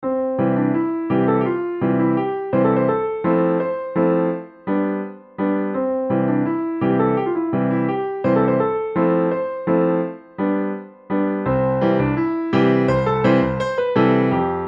advanced solo, piano

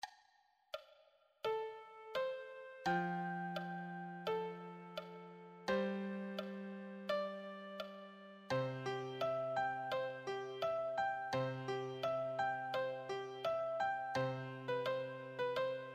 beginner solo, piano

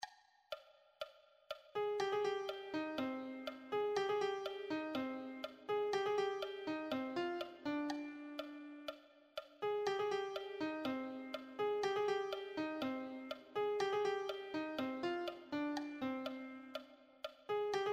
melody, piano

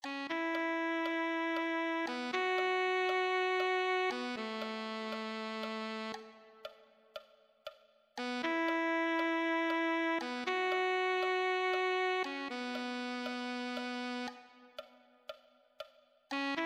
alto saxophone

